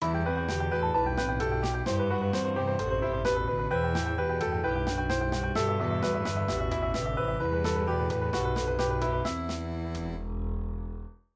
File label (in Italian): anni '80